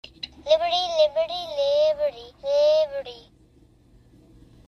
jingle; liberty; doug jr

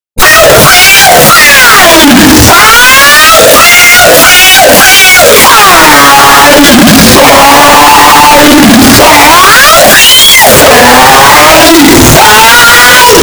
aaaiiiaiiii aiiiiii

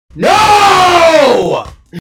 yelling nooooooooooooooooooo

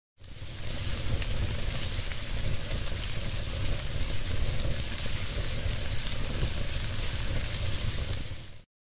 cooking sounds

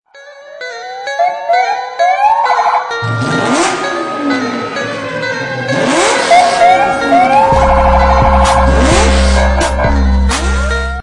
lambo

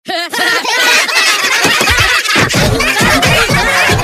minions laughing

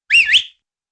beckoning whistle

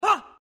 male sound; umk3

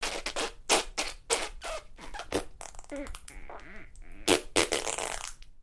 real messy fart

